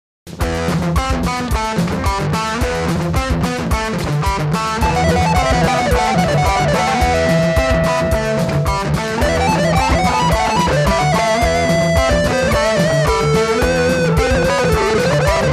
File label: solo